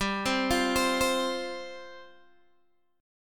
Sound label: G 7th Suspended 4th G down Guitar Open G